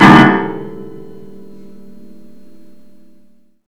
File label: piano